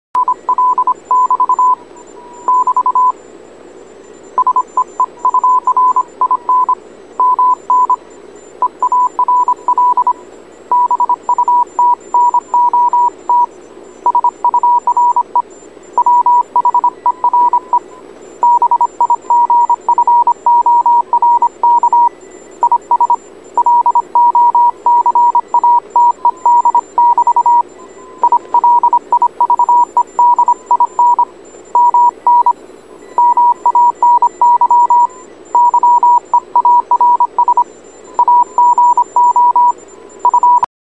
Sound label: morse